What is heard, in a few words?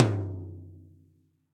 Tom Low Steely Tight; Drums; Drum Hits; Ableton; Session Drums Studio; MPE